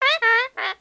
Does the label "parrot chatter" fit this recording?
Yes